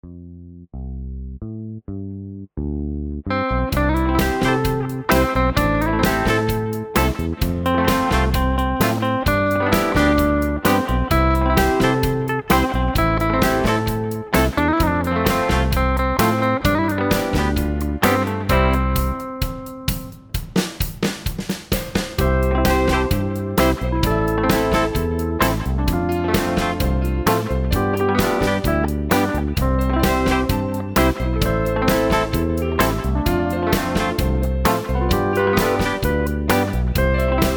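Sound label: Instrumental with background vocals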